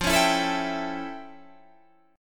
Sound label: F Augmented Major 9th F